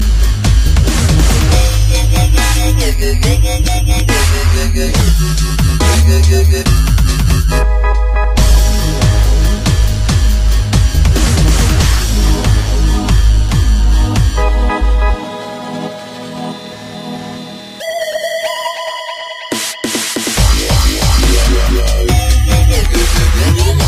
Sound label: Synth